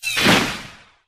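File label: Drone Death Explosion Mid; Titanfall 2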